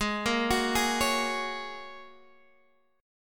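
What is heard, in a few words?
A flat 7th Suspended 2nd Suspended 4th A flat Guitar Half Step Down, chord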